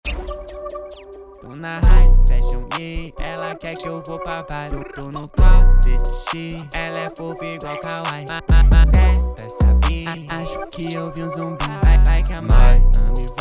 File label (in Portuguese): Sem Drip Vocals